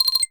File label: ring